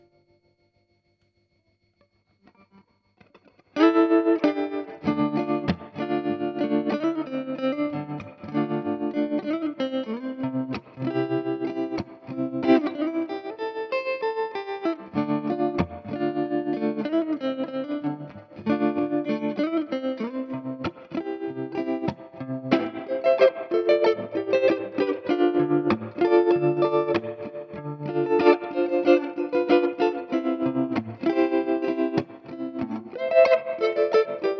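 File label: guitar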